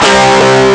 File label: guitar, Amiga Soundtracker Sample; ST-XX Amiga Soundtracker Sample Packs